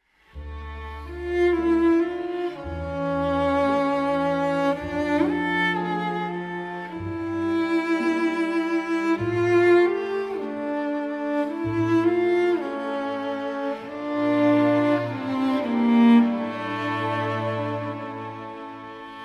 Nocturne in D Minor For Cello and Orchestra; Op. 19, No. 4

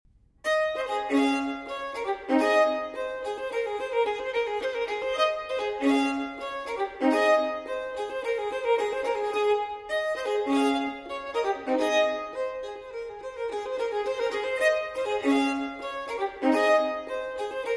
Fantasia For Solo Violin in A Minor, Presto; No. 12; TWV 4025; Telemann Fantasia

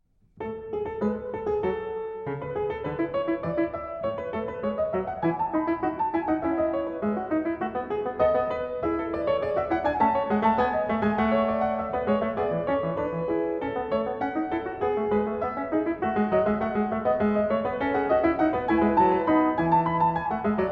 Sinfonia in A Major

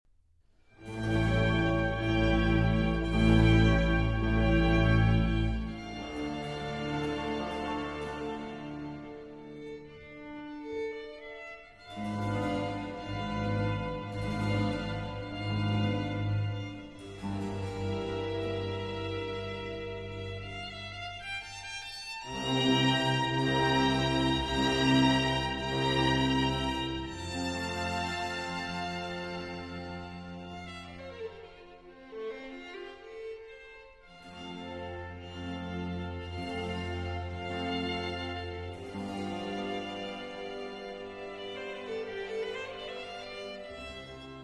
Concerto for 2 Trumpets in C Major

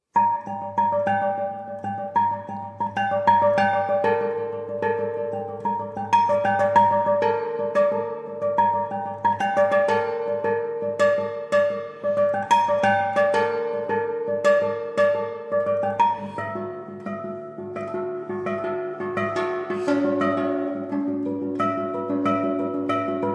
For Prepared Guitar

